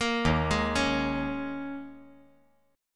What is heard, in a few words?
D sharp 7th Suspended 4th